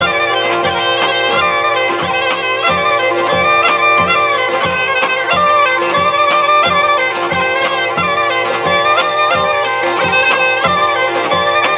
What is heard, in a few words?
Narodno steady 90bpm melody gajda